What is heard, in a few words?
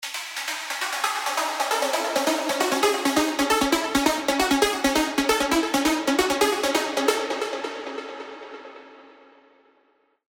Synthetic Dream, trance plucks; alonso spire trance plucks vol 3